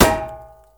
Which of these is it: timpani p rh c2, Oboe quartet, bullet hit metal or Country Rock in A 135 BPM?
bullet hit metal